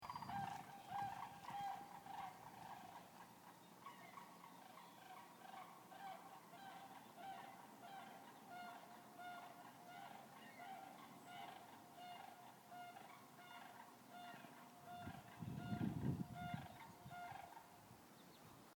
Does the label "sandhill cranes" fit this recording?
Yes